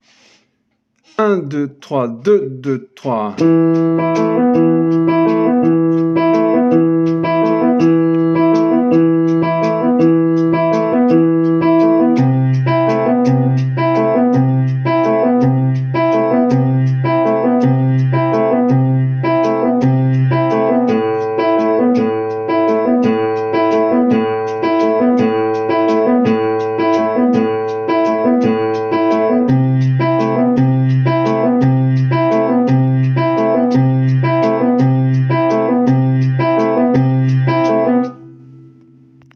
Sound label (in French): ostinato guitares, Valse